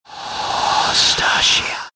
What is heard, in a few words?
hallow, ambient; Garry's Mod; tf2